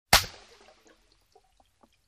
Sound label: Paint Ball Shot Water